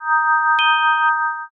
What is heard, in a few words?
save point